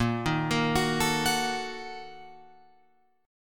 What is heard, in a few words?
Bb-Major 7th Suspended 4th Sharp 5th, Guitar, Open F; arpeggiated; JGuitar